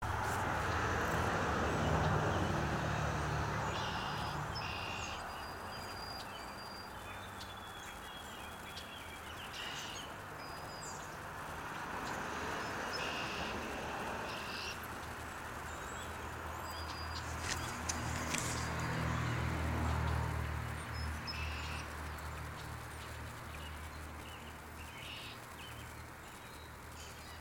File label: backyard symphony